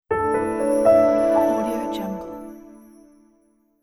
bright soft piano logo

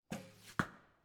arrow into target

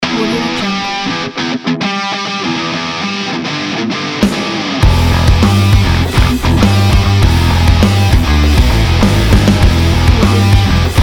hard rock heavy metal sports

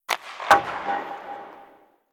slap shot off the glass; sound-ideas

